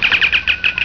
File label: beep